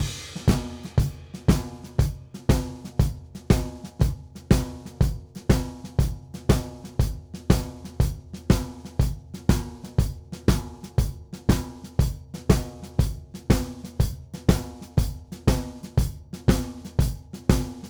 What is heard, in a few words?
Blues Drums; Digidesign; Pro Tools Essential Loops